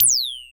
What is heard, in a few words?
FX A, ANALOG; Sound & Vision - Gigapack II